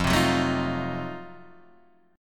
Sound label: F 7th Flat 9th, Guitar Open D; 3,3,4,3,6,3